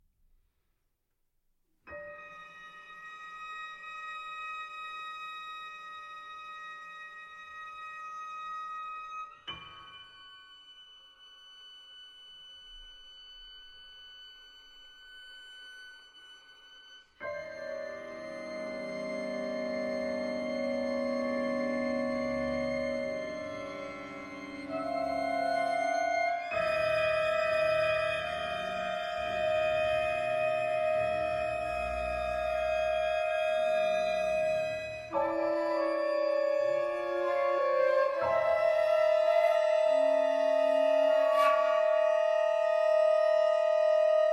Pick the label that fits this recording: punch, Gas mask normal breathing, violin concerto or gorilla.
violin concerto